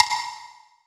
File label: Big Conga